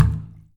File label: Bucket Plastic Hit Low